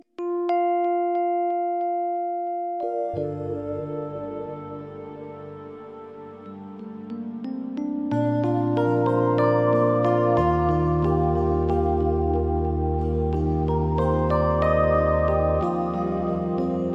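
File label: priyatnaya spokojnaya melodiya, pleasant calm melody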